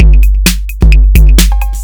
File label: snappy, Drum loops Electro